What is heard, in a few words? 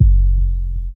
Sub, 808s